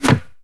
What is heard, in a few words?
Bullet Impact Wood Light; jasem just another shooter with electronic music windows 2017